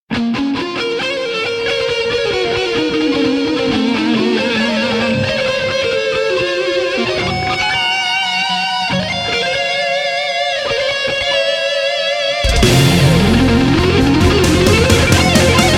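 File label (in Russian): электрогитаре, klassnyy proigrysh na elektrogitare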